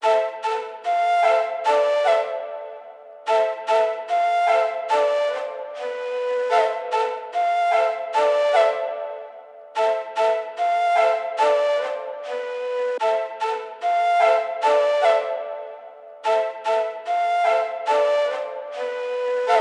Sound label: 148 BPM A Min Kaval; Cymatics - 2022 Melody USB Expansion; Blue Pt 1